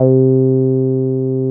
TB 303

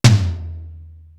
EPIC TOM; East West; Global Sounds

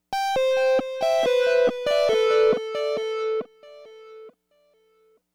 Dubwyzz Keys, Indie Rock; Sonic Foundry (Sony Creative Software); Indie Rock Anthems; Session 04 - Dubwyzz

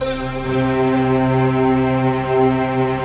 PAD, 140 BPM; Ueberschall; Drum & Bass Resonance

